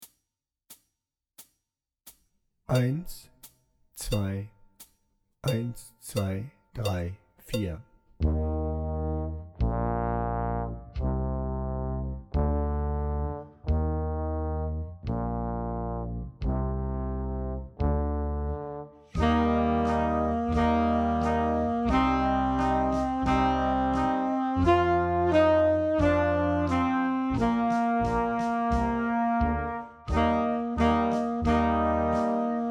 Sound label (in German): March Tenorsaxophon lauter langsamer